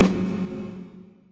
dark under snare; single hits

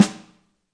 real snare; REAL LIVE KITS

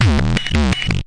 hardcore loop, drum loops and breaks

